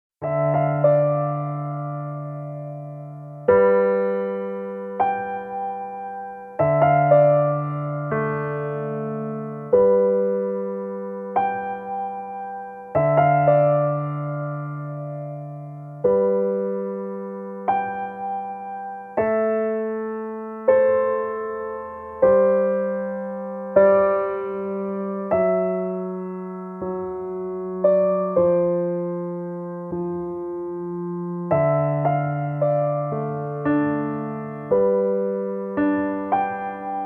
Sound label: Piano Solo